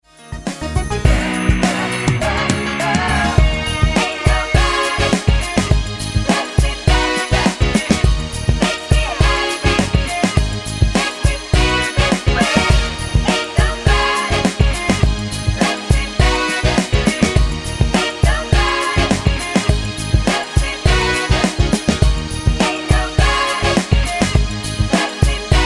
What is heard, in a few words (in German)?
mit Chor